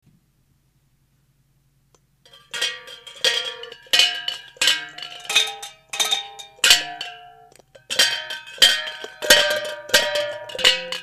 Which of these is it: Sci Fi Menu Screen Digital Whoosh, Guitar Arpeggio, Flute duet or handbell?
handbell